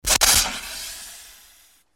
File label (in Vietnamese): ran tan cong bang cach phong noc doc